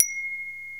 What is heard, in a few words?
BELLISH, PERCUSSION; Crypton - DrumBase5000